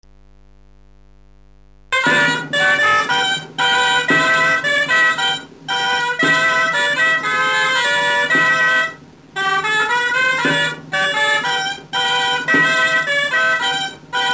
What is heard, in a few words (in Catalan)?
Ball; Vallgorguina